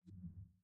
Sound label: reel Bounce; netent; jewelfruits